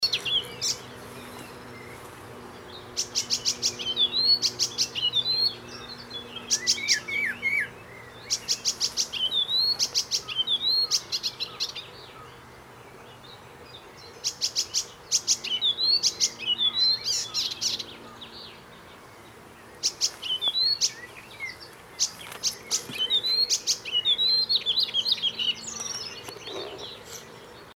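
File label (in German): Gelbspötter; Gelbspoetter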